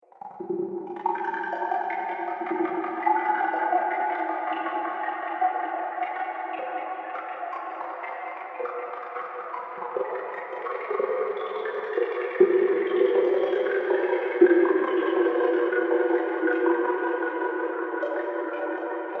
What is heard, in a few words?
Cave Of Horror, scary soundscape